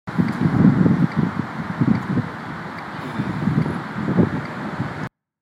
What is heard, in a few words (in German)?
ampel